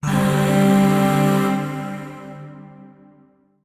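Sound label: Shawzin Pentatonic Minor Chord; Warframe; Zariman; Void Shawzin; Chords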